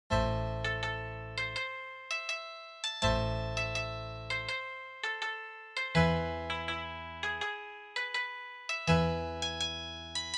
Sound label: Mandolin Concerto in C Largo; Vivaldi